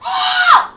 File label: ewwwwwww